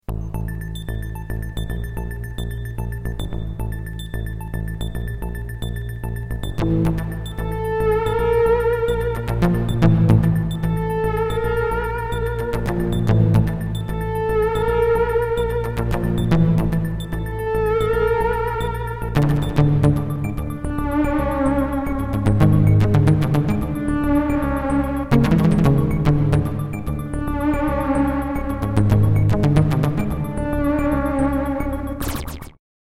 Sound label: Roland CM-32L